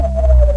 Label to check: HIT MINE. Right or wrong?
Right